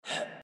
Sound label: Jump; GGJ2022